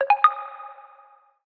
Subtle HTC Touch Pro2 And Diamond2 Stock Notification